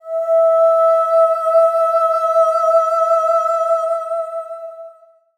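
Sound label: SOP X2 E5 L, soprano; Spectrasonics; Symphony of Voices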